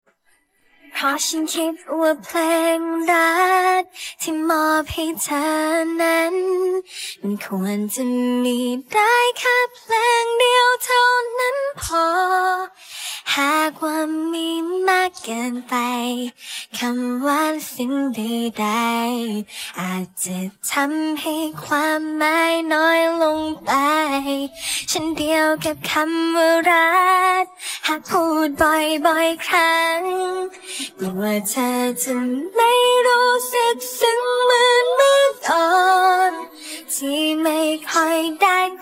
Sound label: cover, vocals